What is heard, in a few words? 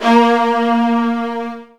A#1, Virtuoso Strings; ILIO; Kirk Hunter Virtuoso Strings VOL-2